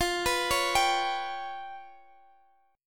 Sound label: G 7th Flat 5th